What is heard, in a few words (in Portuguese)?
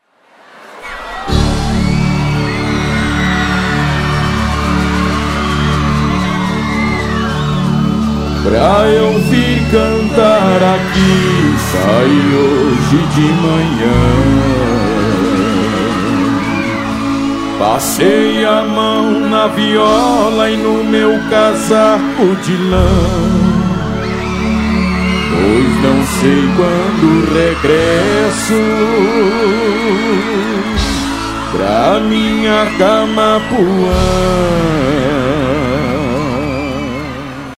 os padrões da viola